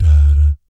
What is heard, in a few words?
BS DADAT, Vocal Jazz FX; Spectrasonics; Vocal Planet; JAZZCOMBO